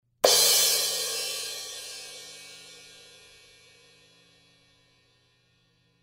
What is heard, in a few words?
S Medium Thin Crash Crash, cymbal